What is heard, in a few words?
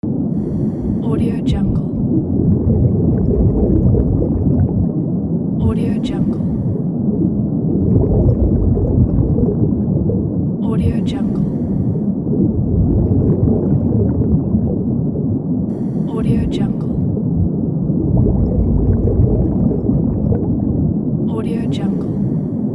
underwater ultra low bass rumble; wistansound